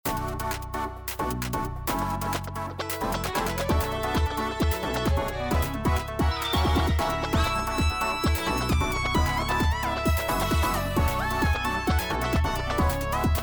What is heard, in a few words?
Lobby Music; Wings of Spirits; Joycity Corp; android